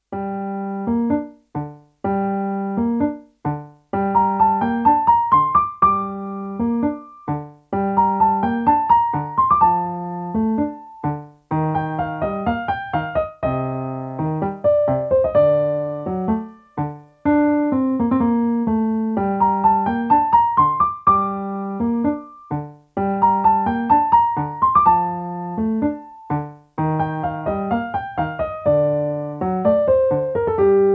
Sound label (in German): Ein aufgeregter Tango, Endtempo